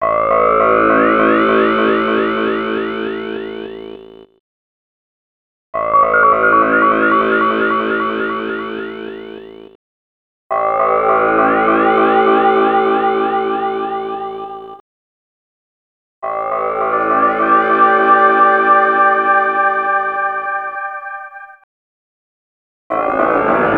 Pad+FX; X-Static Goldmine 5

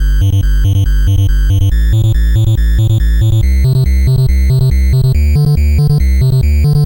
NES Bass, chiptune

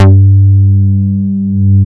Synth Bass, Roland

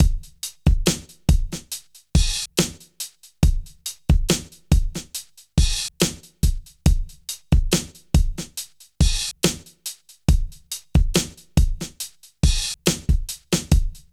HIPHOP, Funky; INIS; Funky Xtreams

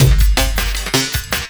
LOOP, Drum & Bass, 170 BPM

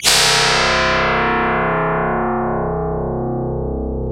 SYN MOON SYN, Dance Synth; Sound & Vision - Gigapack I CD 2 (Roland)

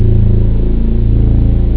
hard string; ST-XX Amiga Soundtracker Sample Packs

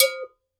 GANKOKWE, African Perc; E-MU Producer Series Vol. 7 – Old World Instruments

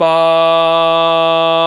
VOX, Male Scats

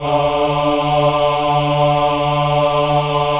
VIB D3, VIBRATO, Voices; Spectrasonics

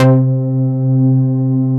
DANC, synthesizer bass; Synthesizers; Soli-Music; World of Synthesizers VOL-1

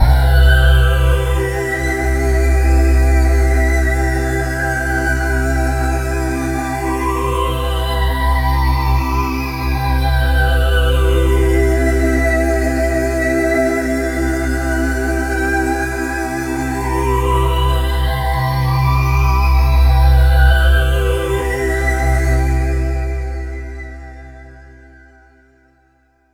MAJ, Synths

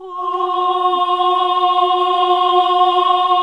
"Ohh" F#2, classic vox

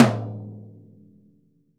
MLTOM F; VELOCITY TOM 2

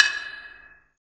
Ohkawa, Percussion; Roland BK-5

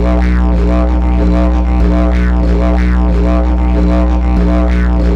World Lick; Sonic Foundry (Sony Creative Software); Ambient Grooves; World Licks

